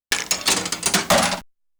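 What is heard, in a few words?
Coin In, pinball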